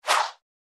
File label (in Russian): Переход резкий взмах